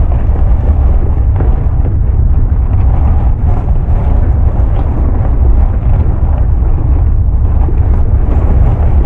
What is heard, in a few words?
rolling sweetner; Rapids; Ice Age: Scrat's Nutty Adventure